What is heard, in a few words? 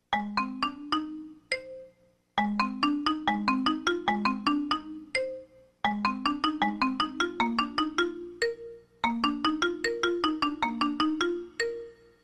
Xylophone